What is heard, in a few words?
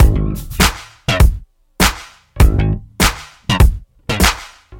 Loop, Drum